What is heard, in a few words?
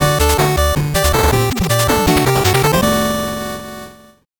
8bit jingle slow